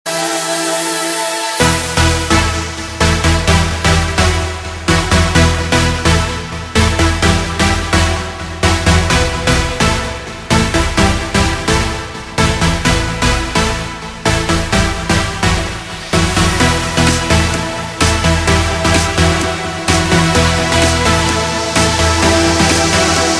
Electro Mix, Ringtones

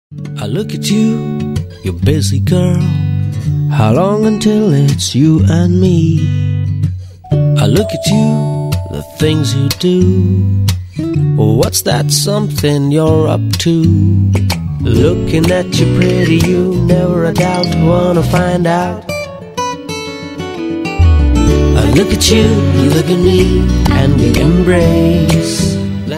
TV ad song; Sunfeast Dark Fantasy Selfie

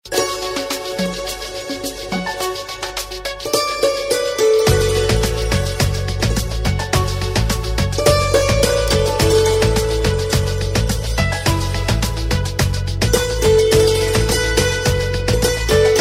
Santoor Mix Instrumental; Krrish 3; Bollywood Hits (2014)

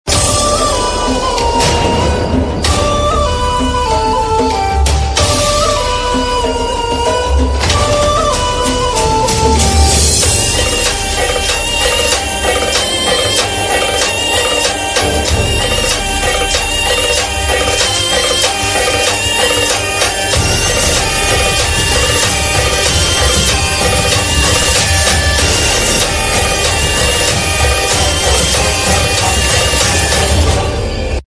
Slow Tune; TV Serial Tone; Colors TV; Naagin

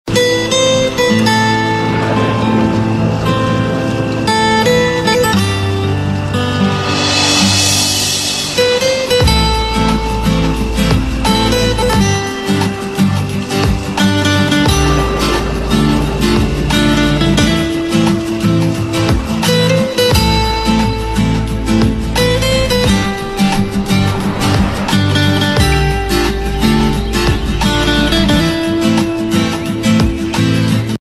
Guitar Instrumental; TV Serial Tone; Star Plus

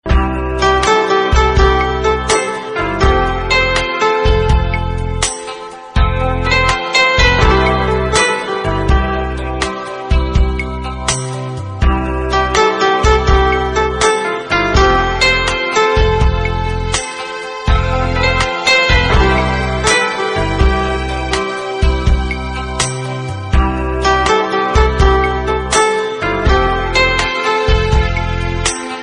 Soft Romantic; Valentine Day Special (2015); Valentine Tones

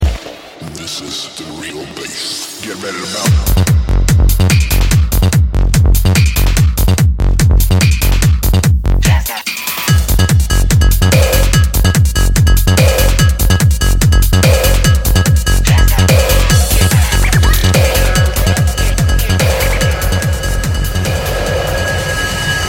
Real Bass

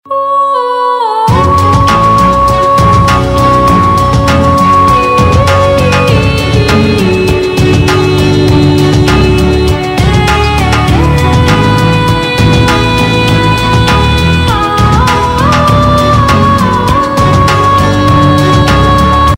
Instrumental; Bollywood Hits (2014)

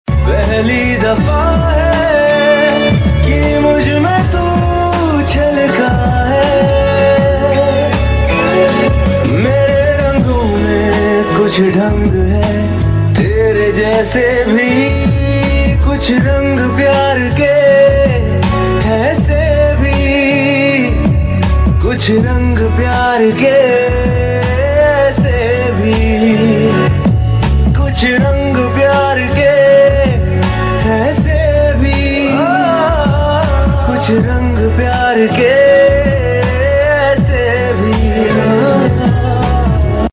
Title Song - Male Version; TV Serial Tone; Sony; Kuch Rang Pyar Ke Aise Bhi

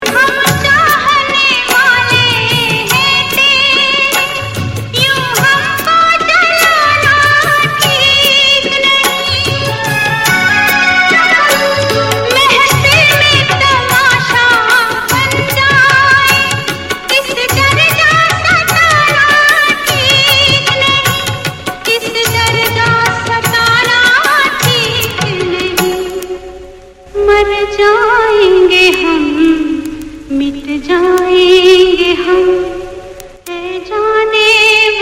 Ringtone

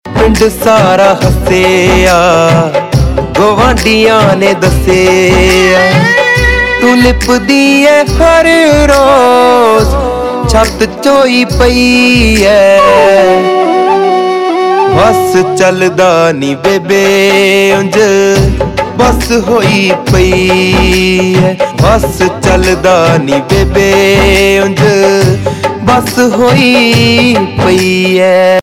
Ringtone, Punjabi

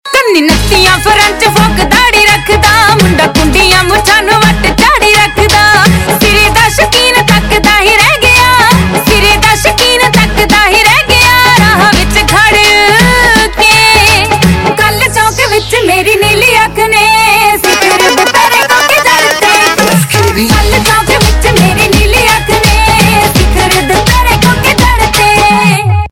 Ringtone, Punjabi

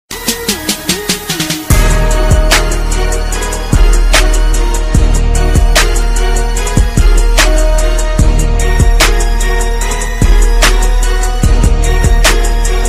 Beat Vs Hiphop; Versus Ringtone (VS)

